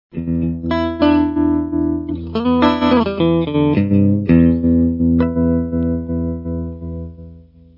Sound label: tremolo